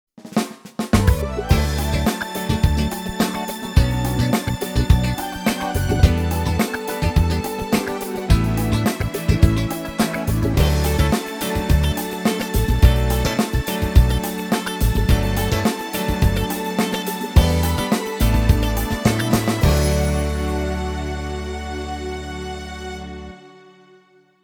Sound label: 70 Disco